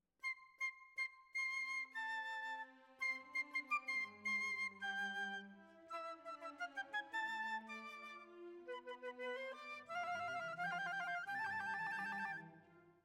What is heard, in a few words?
Flute